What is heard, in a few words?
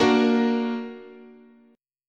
A sharp Augmented, guitar chord